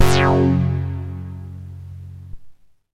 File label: MASS LEAD, SYNTH; Keith Emerson Signature Series